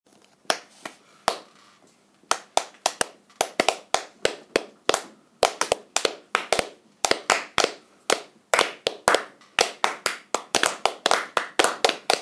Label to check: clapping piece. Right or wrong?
Right